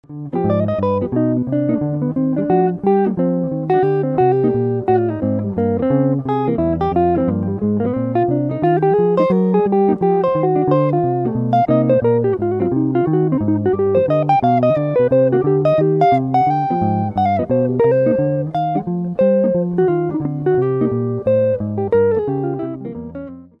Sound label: blues